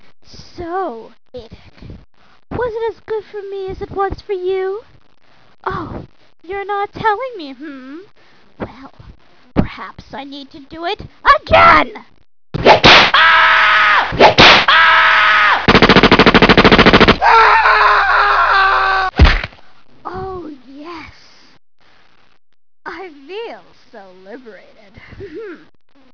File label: whip